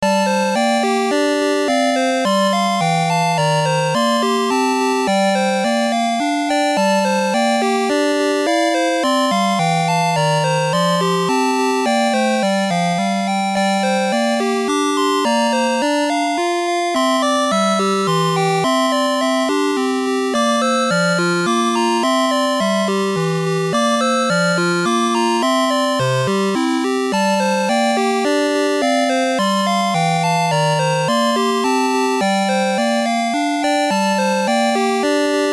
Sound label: BGM, WonderSwan Color; Gransta Chronicle; wonderswan color gamerip 2002